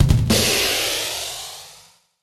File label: rimshot